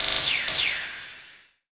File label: Multi Reverb; Resolaser